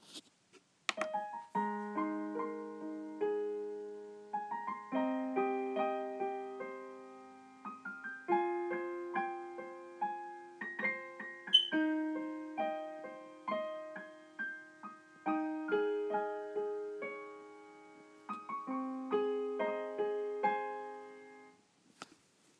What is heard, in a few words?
piano cover